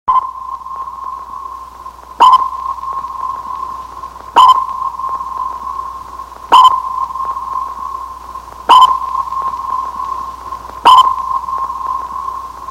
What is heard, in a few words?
Sonar Sound Long